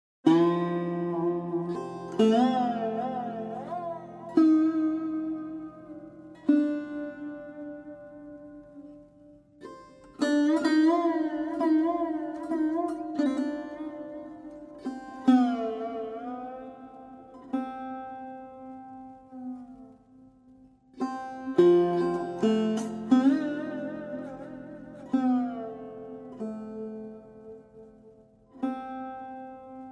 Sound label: The Journey-Instrumental; kolkata; Nobel Chor